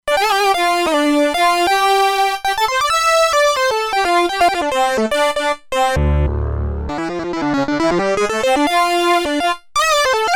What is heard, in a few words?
Prophet Lead; Factory Presets; E-mu Proteus-2